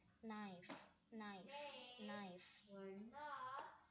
knife

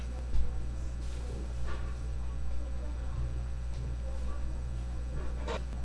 cloth

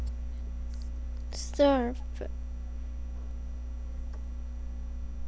surf

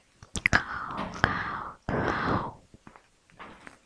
cow

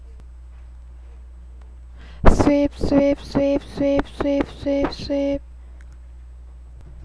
sweep

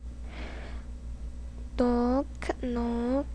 knock

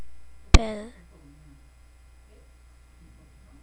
bell

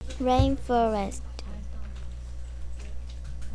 rainforest

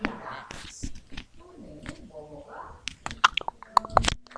pub

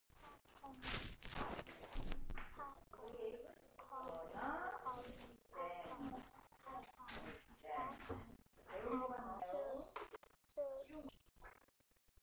popcorn

